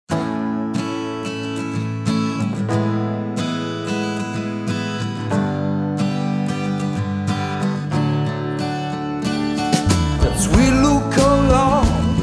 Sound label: acoustic version